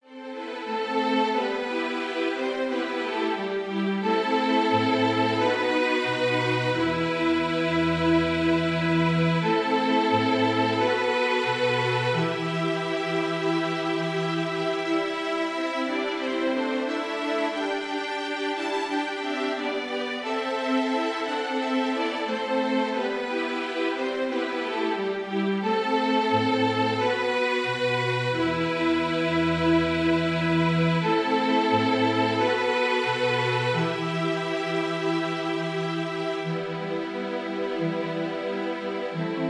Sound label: Bilecanka traditional song